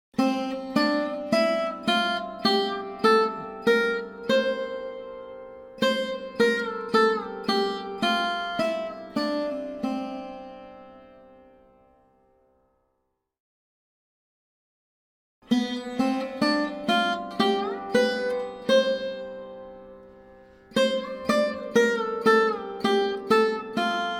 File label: raga desh examples that arohana avarohana chalan